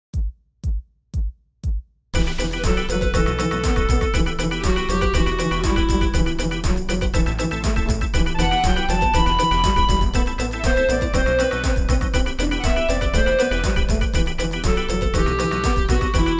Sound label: backing; New Hope Music